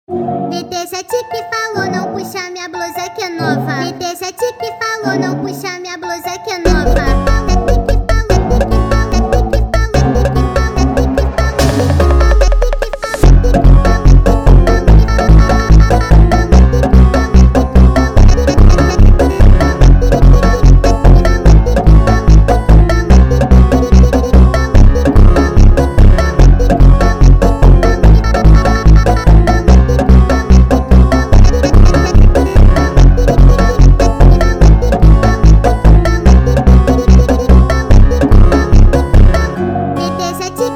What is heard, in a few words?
Funk speed